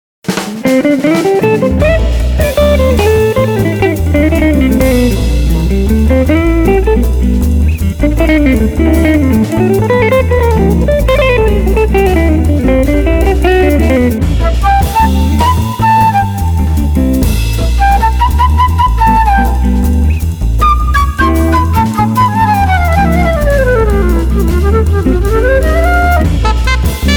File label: JAZZ MOOD